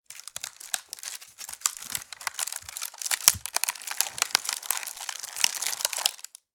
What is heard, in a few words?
Hamper lid push creak and rustle; basket